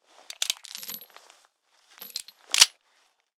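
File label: weapon pistol 44 magnum reload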